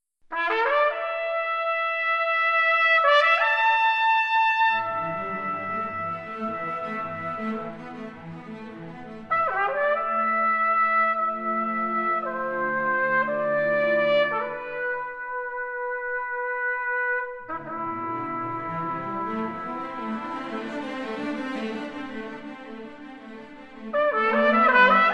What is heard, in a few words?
Sonata Trumpet Strings